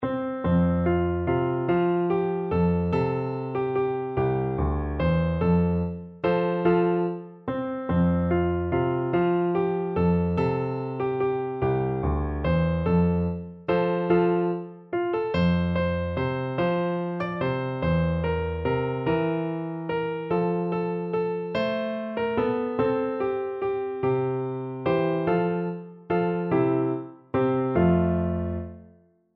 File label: piano